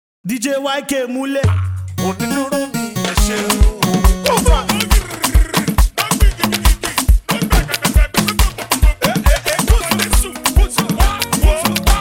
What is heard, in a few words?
Beat Dance Version